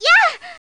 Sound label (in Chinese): 女生惨叫; 惨叫发怒